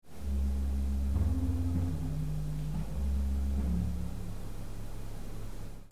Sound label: ped bour, theatre organs